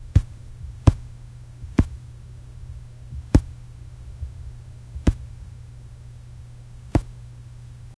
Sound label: soft cat paw steps